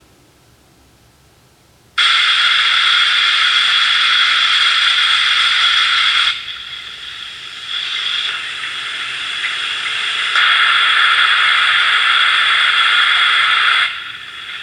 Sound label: Thermodynamic Steam Trap